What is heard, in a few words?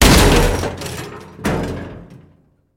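metal impact; css; kfsounds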